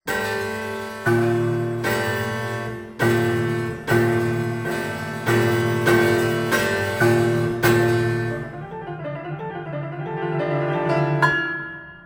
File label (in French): piano prepare